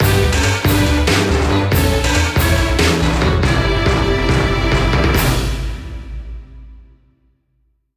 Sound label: Jingle Battle Booby